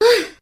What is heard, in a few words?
"woo" girl, voice